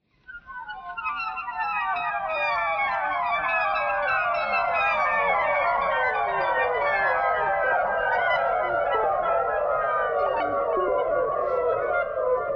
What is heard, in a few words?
clarinet thread noise; clarinet geese